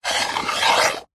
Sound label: sport snooker cue slide table edge; zapsplat